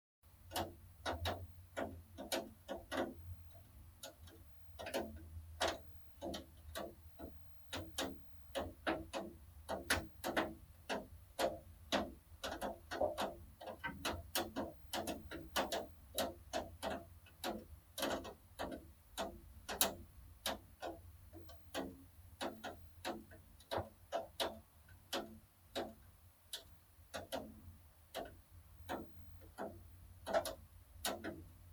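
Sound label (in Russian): звук капель по подоконнику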